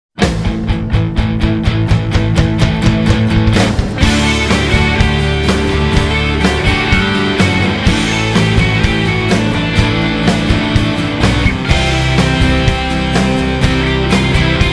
LA Rock; Soundzabound Volume 5

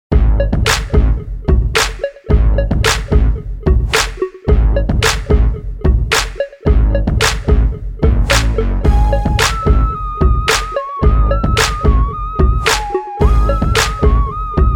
Hip Hop

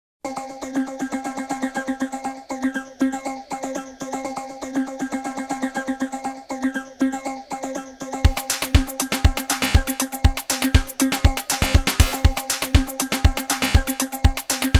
Techno Trip; Soundzabound Volume 4 Disc 2

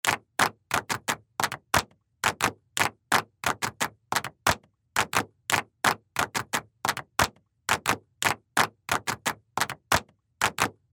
crowd clap

